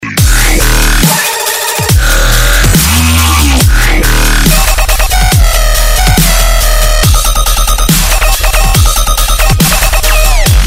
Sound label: dubstep mix